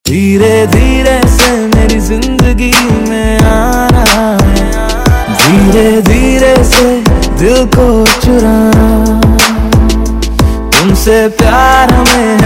Bootleg Mix Ringtone

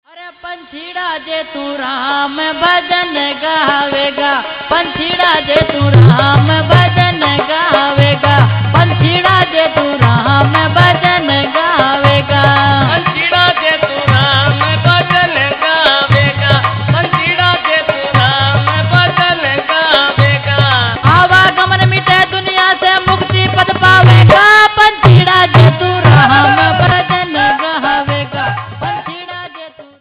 Bhajan; Chetavani Bhajan

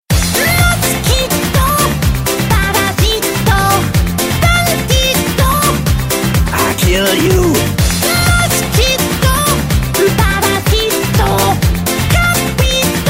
mosquito funny song, Comedy Ringtones

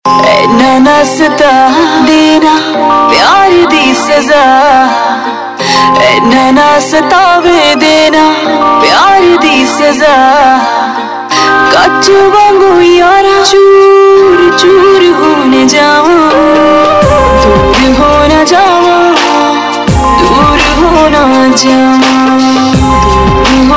Punjabi Ringtone

